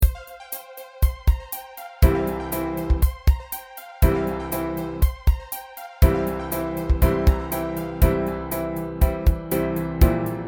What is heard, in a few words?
loops